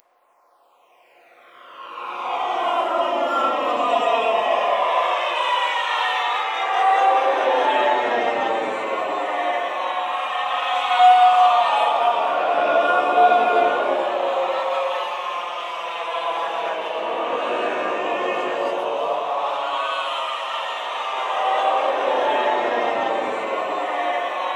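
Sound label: warm up flange, voices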